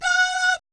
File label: DAHDAT, Vocal Jazz Fx